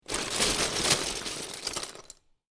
Lego Grab Handful Of Lego From Lego Box; Miscellaneous Toy Sound Effects; LynneMusic

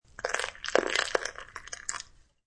Pour Milk Shrt, Fast pour of milk.; Pouring Milk Quick; Kitchen; Household

